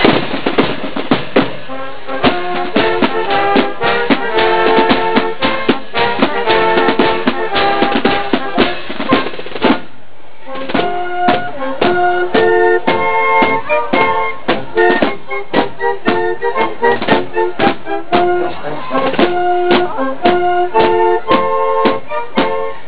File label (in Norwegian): Korps; 17 Mai